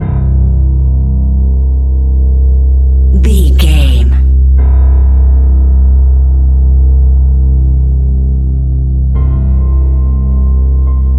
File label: death horror ambience full; Catch 22 Music Network